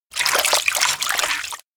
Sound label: duckboat row; anemoi